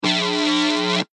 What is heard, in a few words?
Electric Pulse, Short electric high voltage arcing.; LYN111 package of Electricity sounds